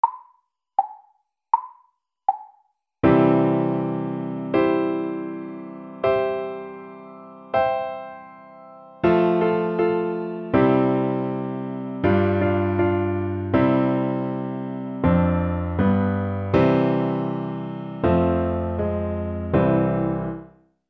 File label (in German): Trommelspiller schnell